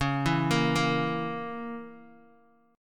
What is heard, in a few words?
C sharp Minor 6th C sharp, Guitar DADGAD